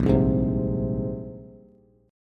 Bb 7th Suspended 2nd down, Bass; Bb7sus2; strummed; JGuitar

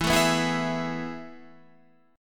A Major 7th Suspended 2nd E

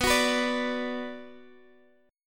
B Minor, Guitar, Open D

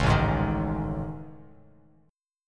A Major Sharp 11th, Bass Standard

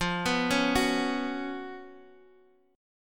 B Suspended 2nd F, chord; arpeggiated; JGuitar

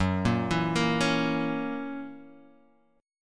F sharp 7th, Guitar, Half Step Down

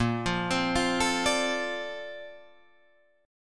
A sharp Major 9th, Guitar Drop D

